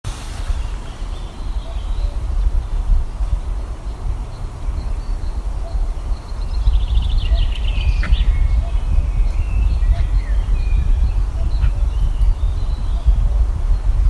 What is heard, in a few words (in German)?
Kuckuck